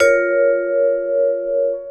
VIBES; Houseworx

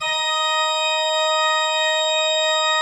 D4, Cathedral Organ; ILIO; Propeller Islands Cathedral Organ

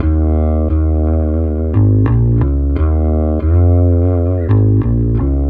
Bass; Sonic Foundry (Sony Creative Software); Not Just Another Pretty Bass

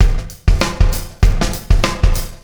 Beat, Processed Drumkits; Event Horizon

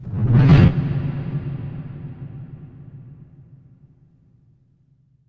impact flame, Cinematic Impact

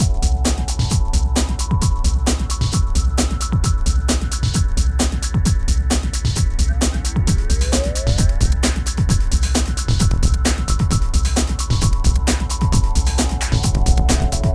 Hardcore Breakbeat, 132 Emin; Big Fish Audio; Hardcore Breakbeat Action

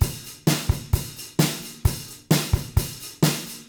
Beat Loops; Zero-G; Monster Beats

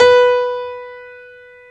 Stereo Grand, Keyboards

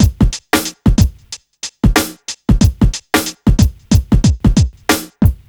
BEAT, Hip Hop R n B

